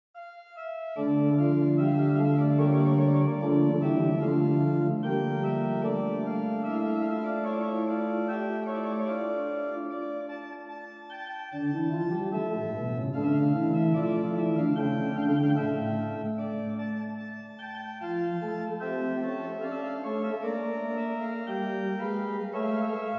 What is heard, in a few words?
Messe Noel, OrganMusic; Fessy & Mine; Souvigny